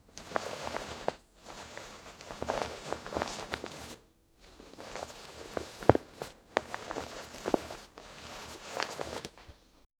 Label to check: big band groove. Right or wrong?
Wrong